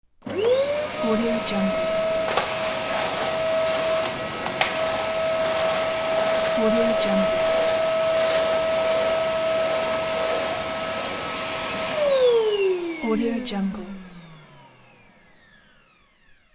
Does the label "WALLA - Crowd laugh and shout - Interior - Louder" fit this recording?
No